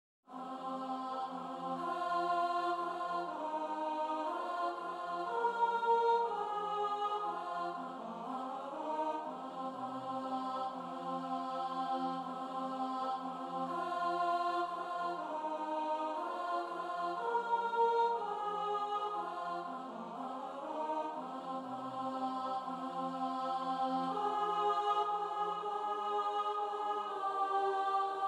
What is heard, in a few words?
alto; Quand je bois